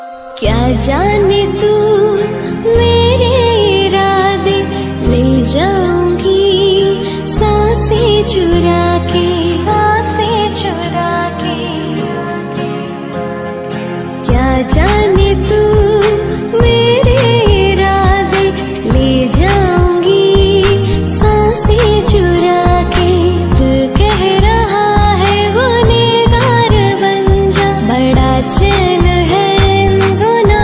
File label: Female Ringtone